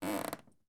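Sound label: interior footsteps wood creak dirty carpet; human sounds; فرش کثیف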